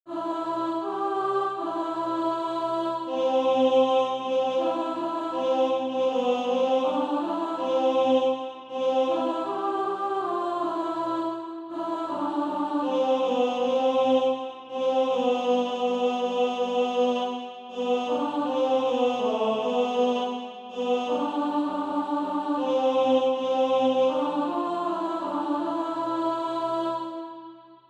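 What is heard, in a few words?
alto, Sheet music (SATB) including Practise Music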